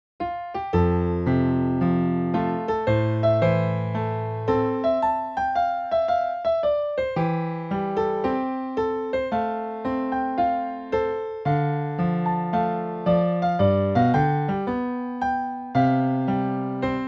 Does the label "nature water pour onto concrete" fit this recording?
No